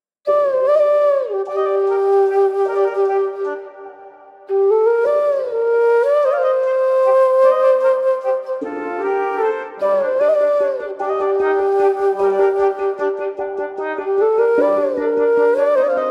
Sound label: Instrumental